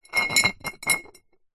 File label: foley glass bottle 1 ltr movement slightly gritty tiled floor